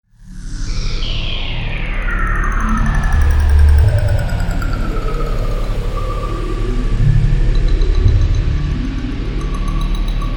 Drone, Synth, Exploration, Scifi; 5.1; BlastWave

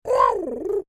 Creature Oodle Bird Speak Phrase Short; Animals

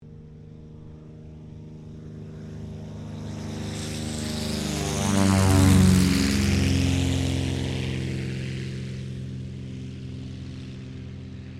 AIRCRAFT CT4A TAKEOFF; CT4 military trainer aircraft; Airplanes; Vehicles

